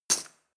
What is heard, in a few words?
cutlery teaspoon drawer place hard